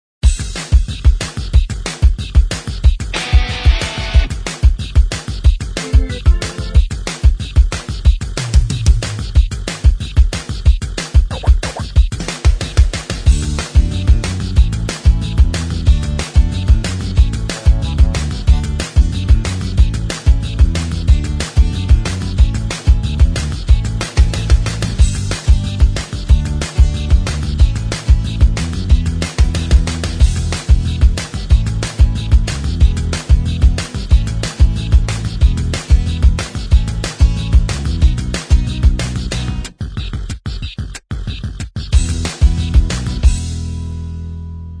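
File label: instrumental track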